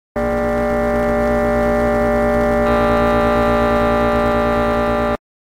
loud creepy sound

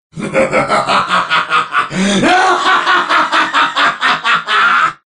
laughter of the triumph of evil

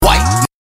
remix

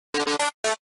first four notes

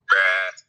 bruahh

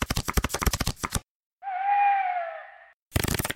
card slide

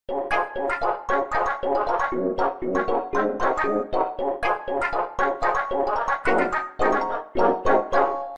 ringtone

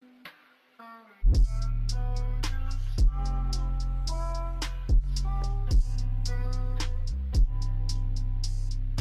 bass boosted

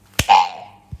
hit noise

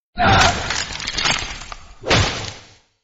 attack sound; scp 096

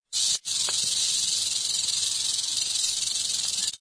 rattlesnake rattler

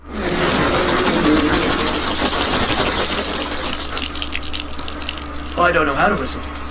dont know how to whistle

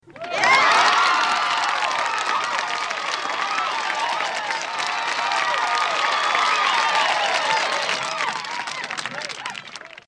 crowd clap

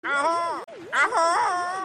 ahoo crow

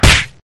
punch sound

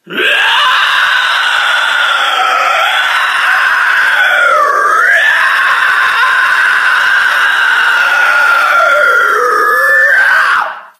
vampire scream long

